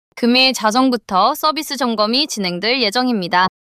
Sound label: neutral long neutral